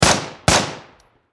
Machine Gun M4A2 Double Shot Close Perspective; Warfare Machine Gun M16 Double Shot Close Perspective; Machine Guns

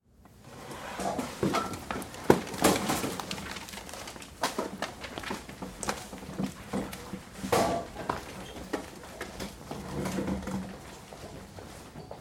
Activity Class Students Up and Leave; Schools; Big Room Sound

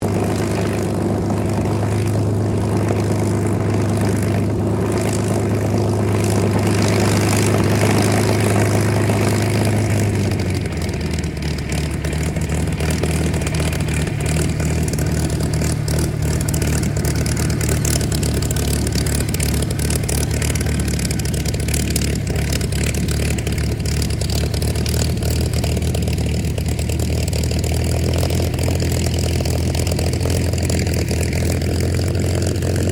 AIRCRAFT SUPERMARINE SPITFIRE MK-VIII TAXI STEREO; Airplanes

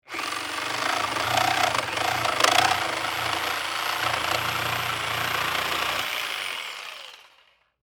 Jig Saw Cut Wood, 1X4, Short; Saws (Sound Effects:Machines); BlastWave

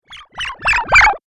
Cartoon Magic Spell

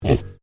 Animal Pig Tamworth Grunt; Hamish; Edgar's Mission Farm Victoria; Pigs; Animals

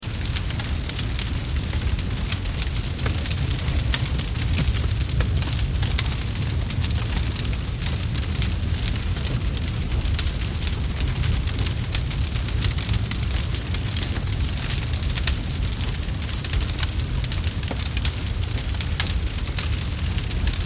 Blaze Large Heavy Crackle Loop; Fire; Flames; Objects; Flame Sound Effects

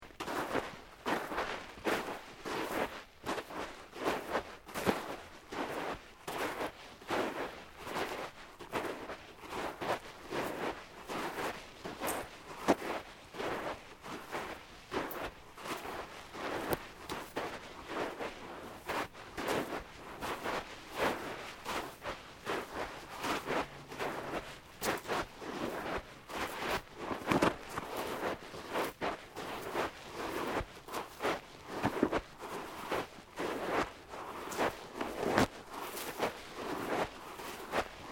Footsteps Boots Sand Deep Sequence; Footstep Foley; Footsteps (Sound Effects:Human)

